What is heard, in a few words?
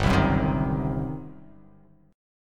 G Major 7th Suspended 4th Sharp 5th, G, down